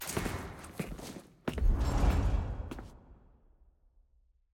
click; archive vi

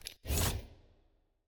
loot reroll button deactivate; lol

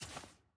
ui generic section paper click

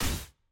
medium; samira

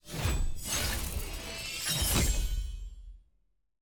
celebrate ranked promote to diamond; leagues

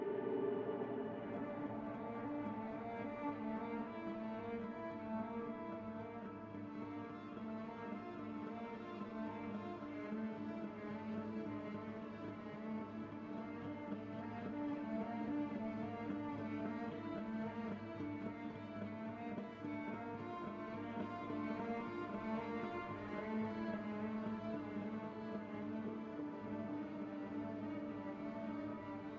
mood loop sad

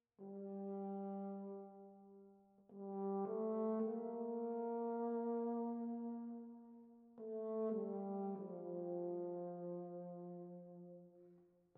ambient music

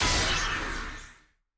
skill hit; shaco

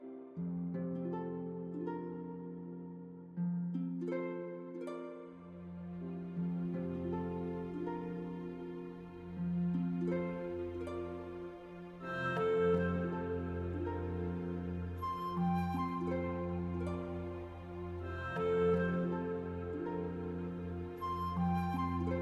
theme

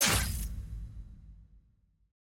ui abilities equip

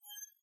save button hover; rgm augments

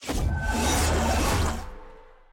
skill charge; viego

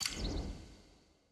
ui main click; guardian

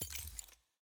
browse right click; skins viewer

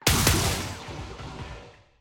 action gunfire

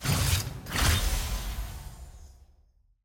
open masterwork chest intro; loot; lol

